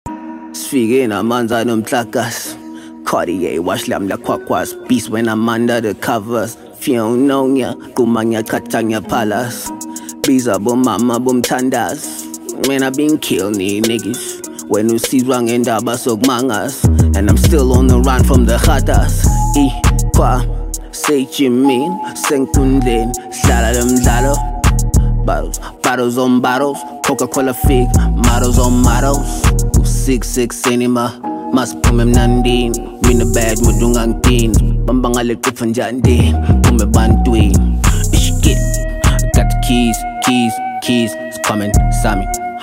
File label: Piano